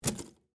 Typewriter Key Stuck, Technology, Typewriter, Key, Stuck; Typing; Offices